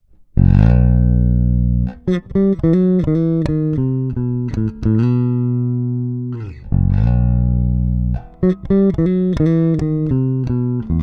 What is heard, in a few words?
Both active neutral